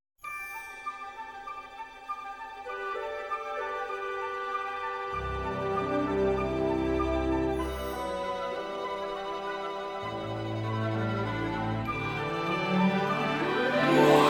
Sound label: Instrumental